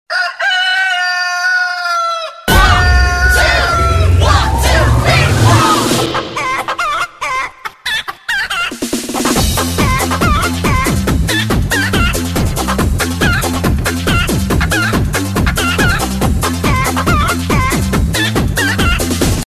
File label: Chicken